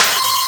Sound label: Snare; Vengeance Samples